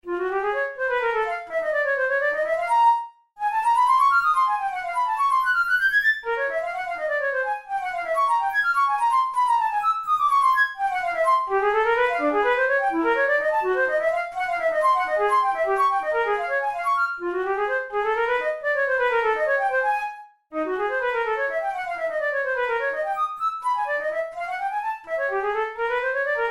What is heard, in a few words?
studies for flute solo; karg-elert